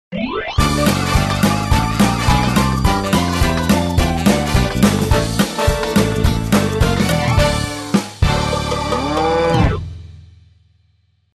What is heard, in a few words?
Game Complete